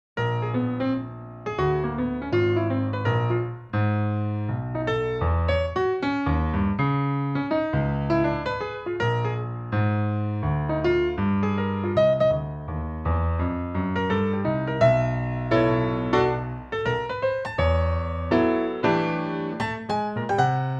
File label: Blues